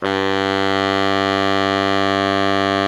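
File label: baritone mezzo forte G 1, Sax; BARITONE MF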